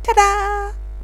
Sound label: tadaa